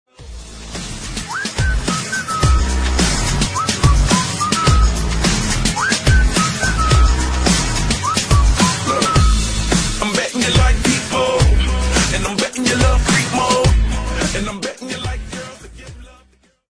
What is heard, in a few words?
whistle